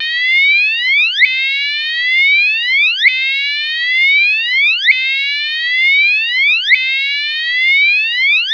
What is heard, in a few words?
WHOOP